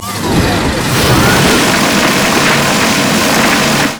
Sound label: rock saw stationary; LoL; emitters map8; odin